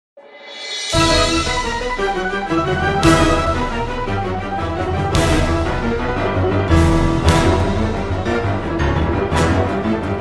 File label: Piano Fantasy DANCE; Dance-Lyric Ballet Tracks